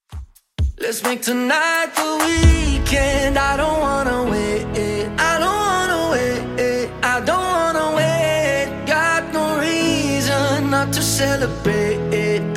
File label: Top40, 130bpm